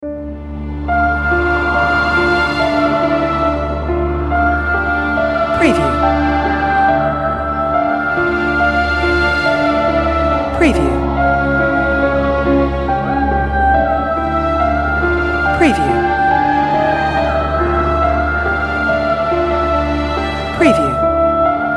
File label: Dramatic Mysterious Storytelling Horror Dark Sad Emotional